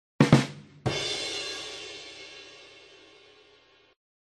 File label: punchline